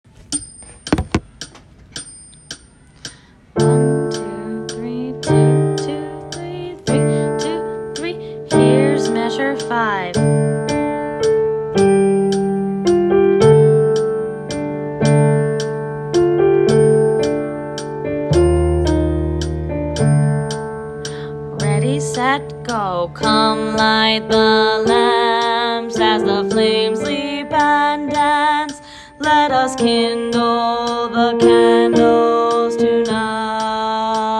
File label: bass